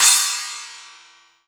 Ch Splash; EMU Orbit-3

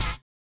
collision; Spectrasonics; Metamorphosis

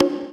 Percussion, House

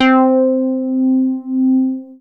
MOOG BASS C4; Best Service Dream Experience

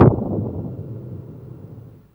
thunder hit, spacey drums; Spectrasonic Distorted Reality 1

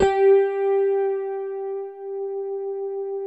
E-RHODES

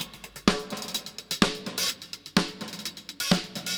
Funky16 127, Beat Loops; Zero-G; Monster Beats

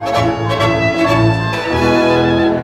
VIOLIN HITS; Best Service; World Colours

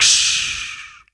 VOC DRUM, MOUTH PERC; Best Service Hyper Dance

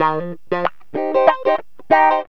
GTR, CHANK; Zero-G; Funky Elements

